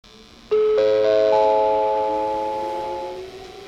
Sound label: chime; wakayamadentetsu